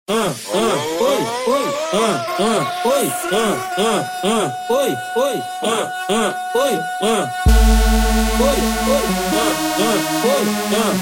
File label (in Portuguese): cd mega funk eletro funk